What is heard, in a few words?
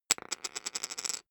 poker chip fall wobble